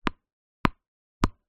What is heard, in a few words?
sport tennis ball bounce hard court x3